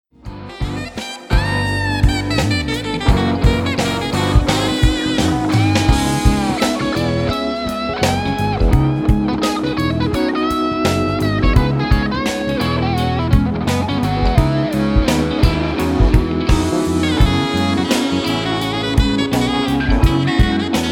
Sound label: blues C sharp; Blues in C Sharp (excerpt); Polskie Radio, 2014